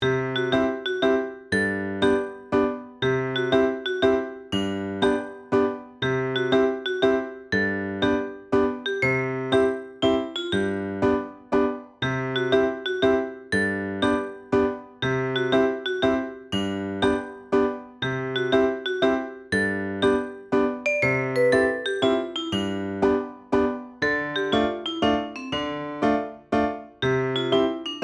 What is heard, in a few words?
BGM DayTime